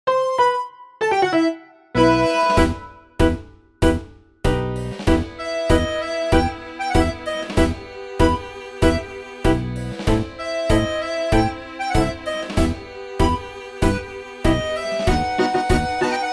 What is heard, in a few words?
tango, ethnic